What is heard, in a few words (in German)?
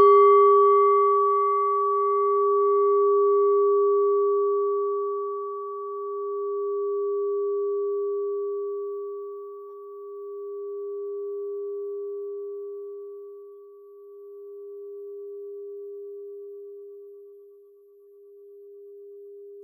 klangschale nepal, Klangschalen Nepal